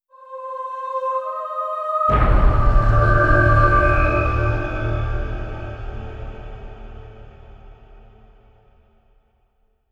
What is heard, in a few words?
Round Start Short; Zombie Der Riese